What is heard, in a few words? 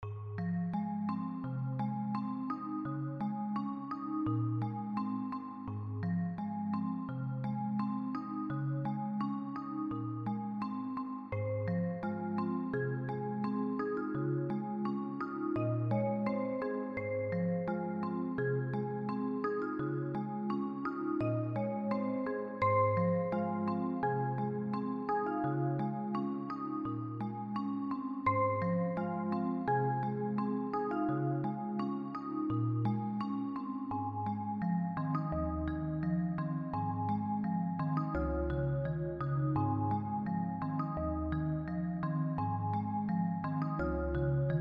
chimes cover